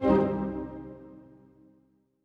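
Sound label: orchestra; Masterbits - Switch FX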